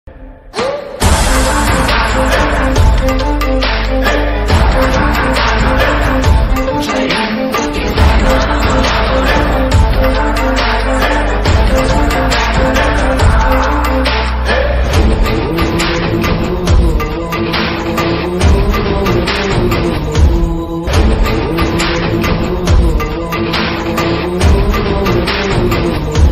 action ringtone; shershah movie

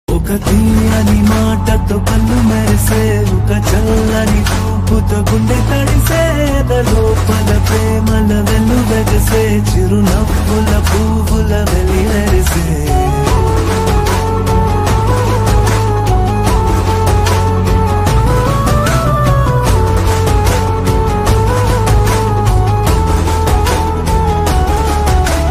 love bgm ringtone, telugu; arjuna phalguna movie

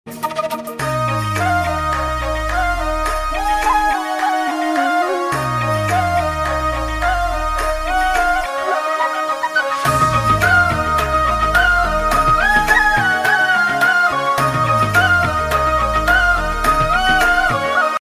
instrumental flute

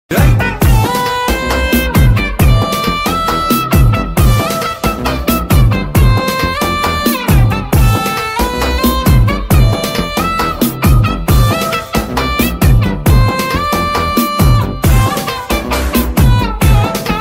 funny bgm; santosh soban; priya bhavani sankar

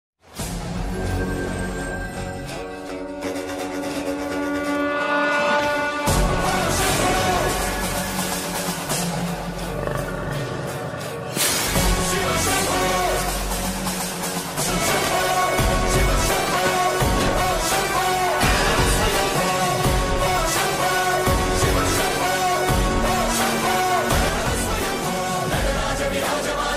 akhanda ringtone bgm

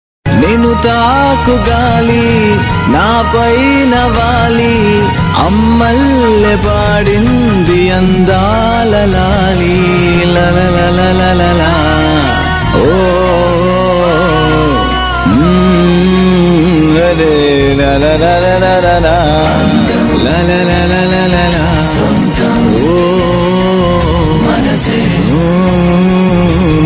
love bgm Telugu ringtone; raghuvaran btech

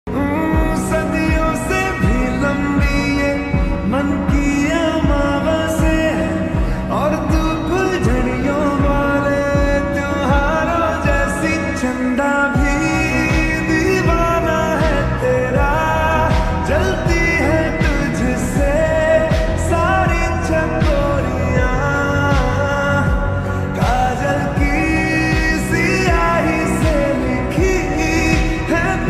slowed reverb lofi

